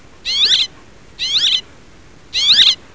Petronia petronia

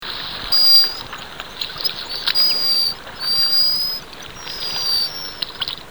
Cepphus grylle

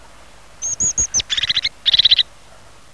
Parus cristatus

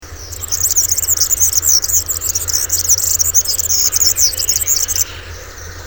Serinus serinus

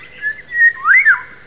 Oriolus oriolus

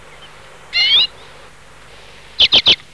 Carduelis hornemanni

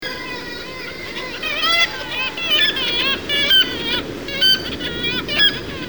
Rissa tridactyla

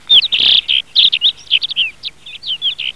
Prunella collaris

